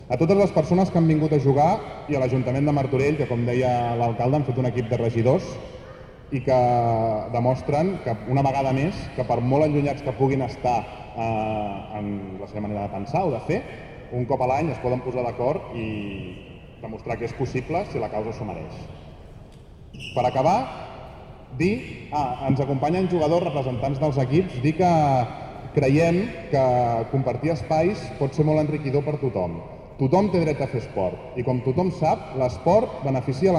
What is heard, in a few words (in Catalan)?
Trilles